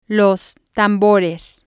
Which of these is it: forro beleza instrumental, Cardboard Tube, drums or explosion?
drums